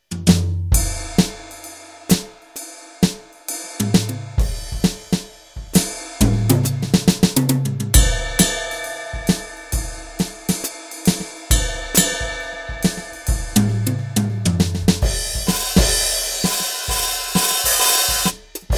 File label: Behringer XD80USB Brush Kit